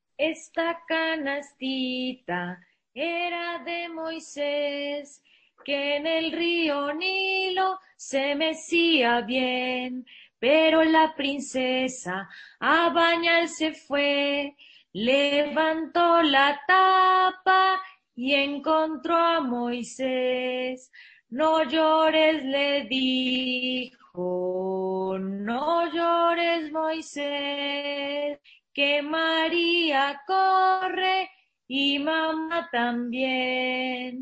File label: Baby Moses (Spanish)